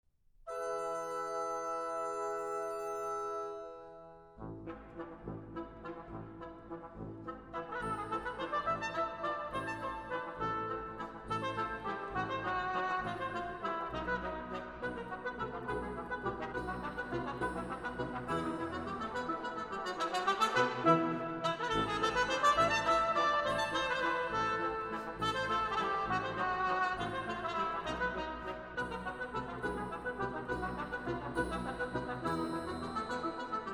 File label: Anitra's Dance, Brass Ensembles; Greig; Peer Gynt